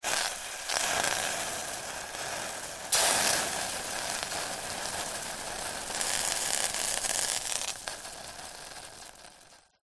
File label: household pan hot water drop in sizzle; zapsplat